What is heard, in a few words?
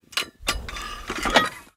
Weapons Cannon Reload; DCS